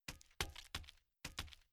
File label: cat footstep